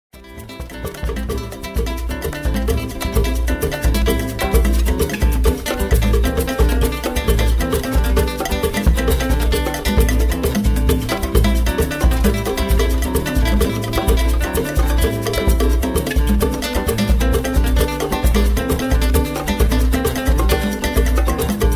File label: Brazilian wave